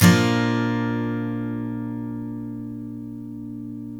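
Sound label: STL A# Major DN, Guitars steel chords; Spectrasonics; Hans Zimmer Guitars VOL-1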